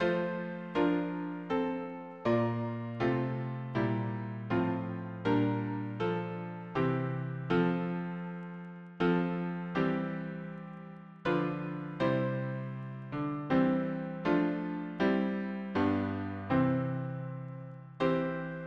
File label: harmony piano